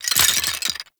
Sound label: Bone Effigy Destroy, Destructible; Gameplay; Warframe; Tau; Destructible Dynamic